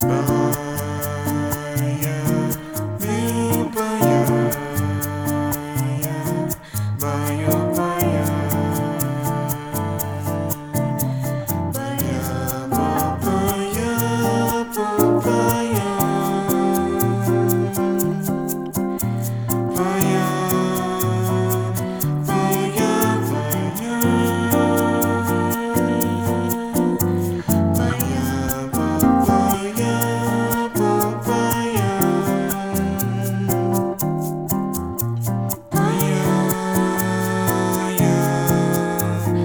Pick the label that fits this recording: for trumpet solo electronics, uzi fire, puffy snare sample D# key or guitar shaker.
guitar shaker